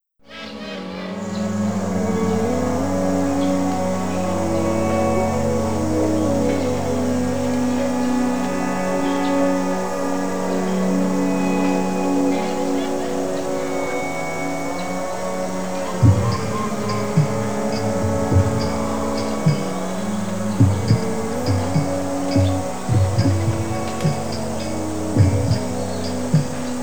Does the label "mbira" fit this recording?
No